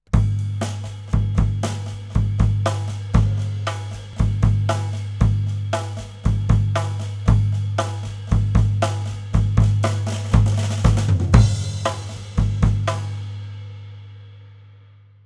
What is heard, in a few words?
Kick Rear Whole Kit